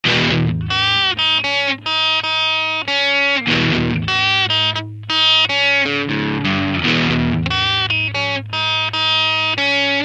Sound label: ft crunch